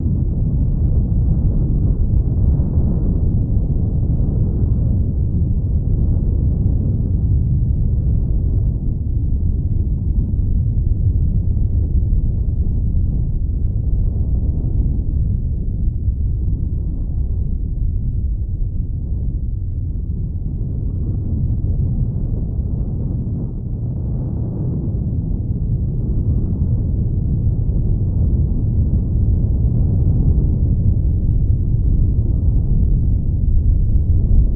Volcano Eruption Low

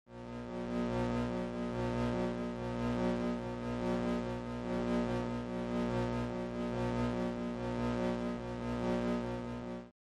Elect Hum Buzz; buzz & hums

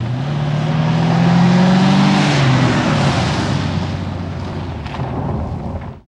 Cadillac Accelerate By, Skid Gravel

